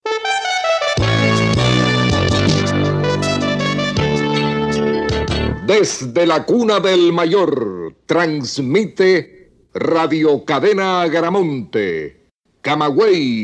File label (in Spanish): Identificador Musical